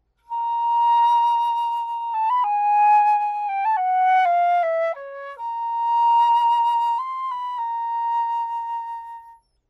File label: FL Sample Dry